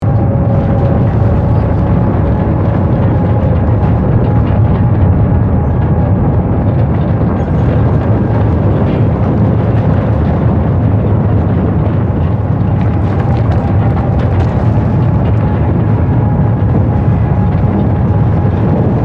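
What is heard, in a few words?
Ambience Safe Room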